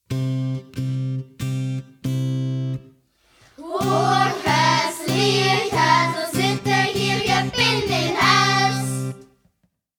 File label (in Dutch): Hoog Huis Leeg Huis, NieuwjaarsZingen; 31 december; bedelzangtradities